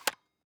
mag repack single; ACE3; Arma3; magazinerepack

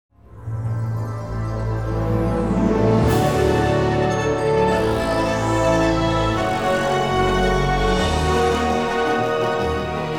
music; freelancer-hd-edition; malta

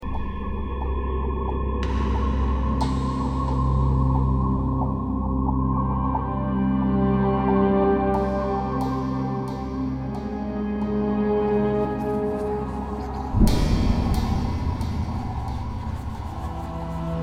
music space; freelancer-hd-edition

